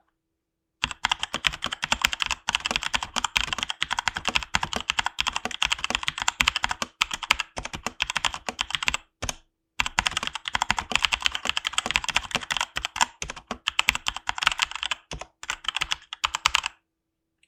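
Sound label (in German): GK650K Schreiben, Tastatur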